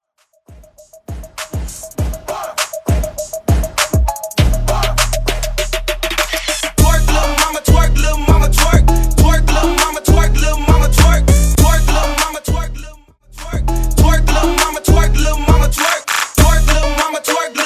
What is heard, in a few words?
Twerk Hype Redrum